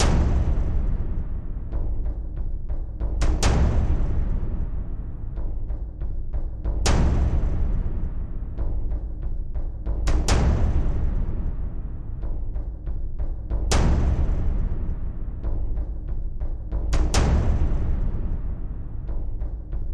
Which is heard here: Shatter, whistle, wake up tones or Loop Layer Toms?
Loop Layer Toms